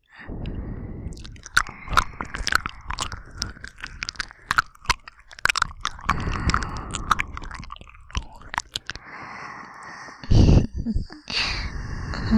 バイノーラル 耳レイプ ねっとり両耳舐め 耳舐め 発情吐息, ASMR

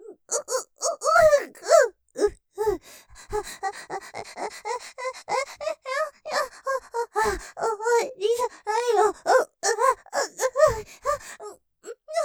声にならない感じ方・ふやふや感; 絶頂スパート; ループで楽しむ！シェフの気まぐれ抜き抜きコース

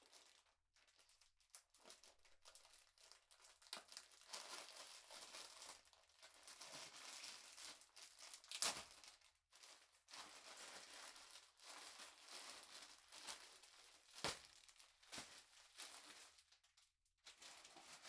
食材の袋を開ける; KU100; 食事に関する音

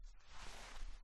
近寄る音

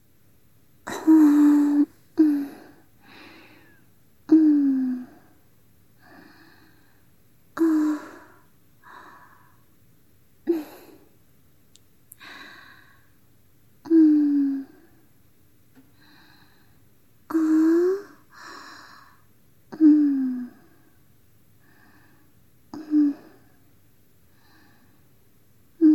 吐息ループロング(バイノーラル編集）; みみあね。 ～世界で1番弟クンを甘やかすお姉ちゃん～; 囁き・添い寝・立体音響

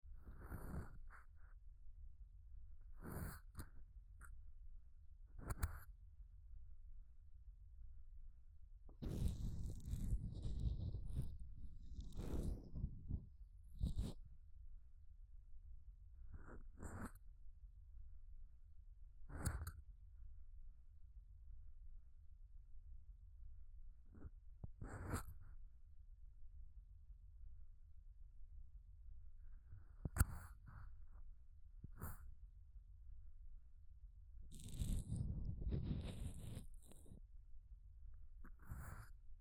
耳かき効果音のみループ右

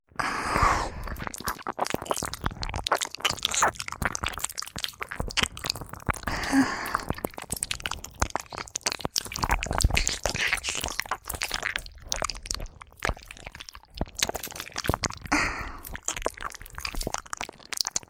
耳舐め 手コキ のみ 右耳用

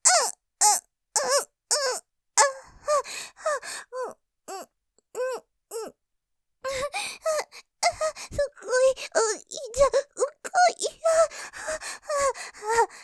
喘ぎ さらに感じて声が出ちゃう; すごいよぉ; モノラル; ループで楽しむ！シェフの気まぐれ抜き抜きコース

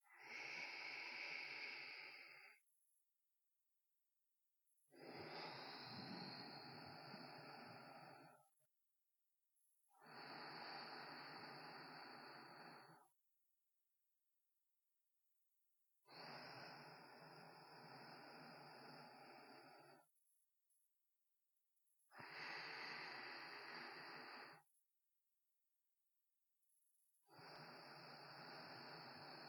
寝息 まばら

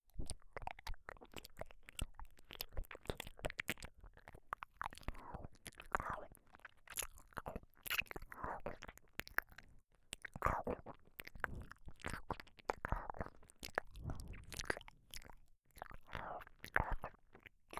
耳甘噛み

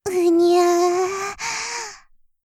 目覚める; 失神中

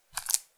くちゅ音単発

